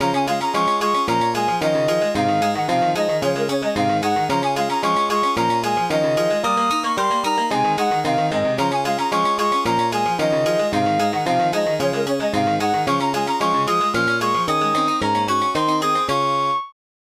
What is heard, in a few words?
Piano; Ultima Runes of Virtue II; SNES